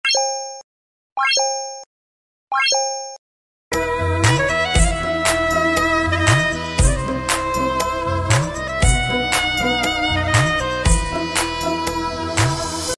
ringtone music; upendra

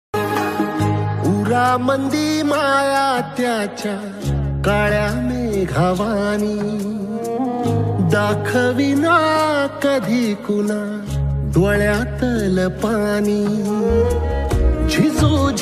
marathi ringtone

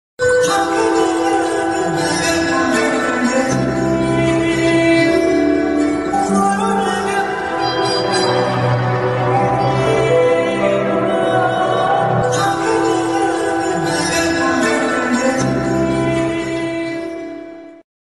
love bgm; ponniyan selvan; trisha; karthi

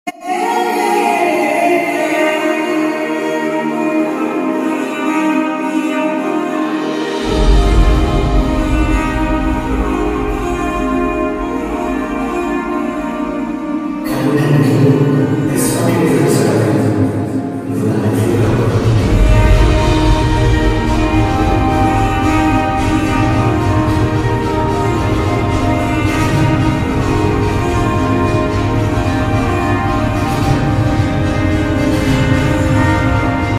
violon bgm; dear kavya season 2

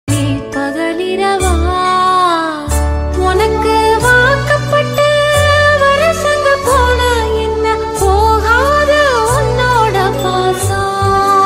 tamil melting love

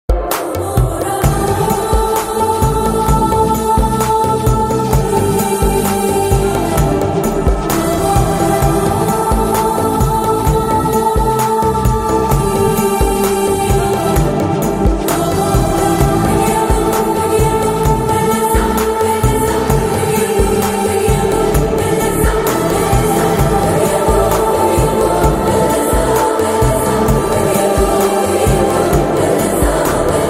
bgm ringtone instrumental ringtone

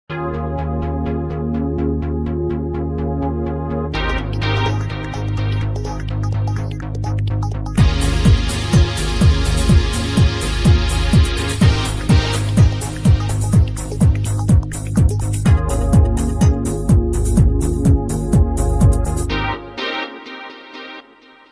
disco ringtone instrumental

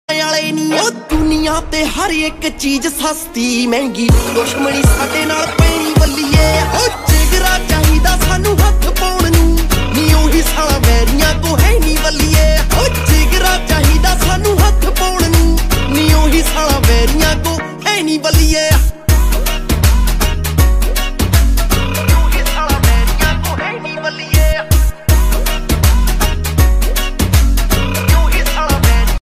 new punjabi song ringtone

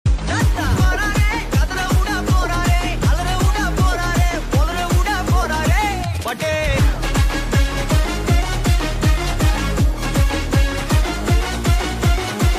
remix ringtone

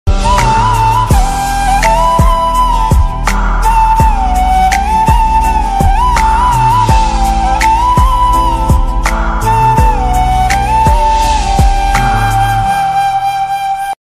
ringtone instrument

